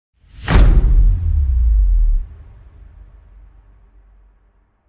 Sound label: Impact